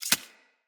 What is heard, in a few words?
Arachnoid Micro Step Snow; Corpus